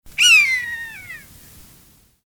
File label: Garrulus glandarius